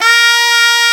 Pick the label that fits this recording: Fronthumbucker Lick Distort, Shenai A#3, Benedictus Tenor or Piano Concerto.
Shenai A#3